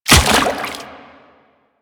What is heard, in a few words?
Step Water, Footsteps; Warframe; Quests; The New War; Kahl